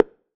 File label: perc mod blast